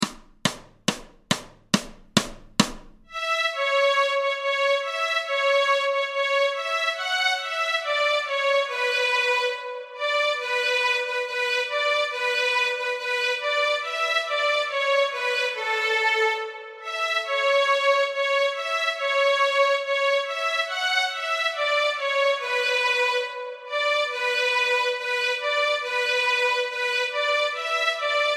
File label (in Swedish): Små grodorna Stråk A, Fiol; Notträning